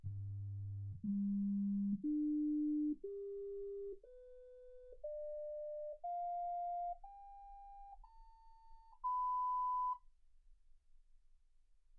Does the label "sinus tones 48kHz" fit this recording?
Yes